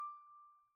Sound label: glass D5 Fast Decay, Wine Glasses; Idiophones; Friction Idiophones; Releases